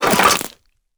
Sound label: trash; KitchenChaos